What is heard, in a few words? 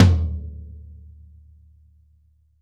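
floor tom; drum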